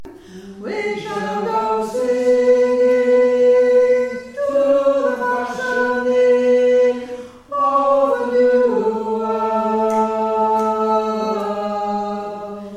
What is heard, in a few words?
Tenor